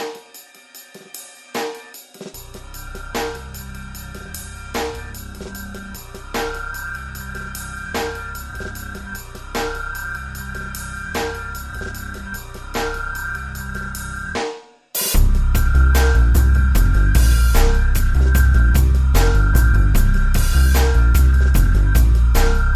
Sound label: GAME RINGTONE, INSTRUMENTAL; ARMORED CORE VI FIRES OF RUBICON